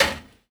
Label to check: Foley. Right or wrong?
Right